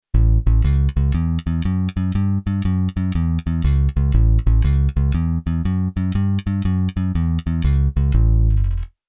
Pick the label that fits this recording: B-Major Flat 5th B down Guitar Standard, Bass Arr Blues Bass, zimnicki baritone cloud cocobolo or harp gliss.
Bass Arr Blues Bass